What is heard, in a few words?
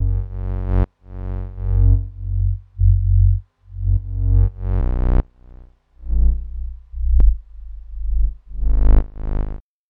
Bass Loops Swarm Bass Abm 100